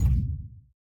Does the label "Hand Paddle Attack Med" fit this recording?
Yes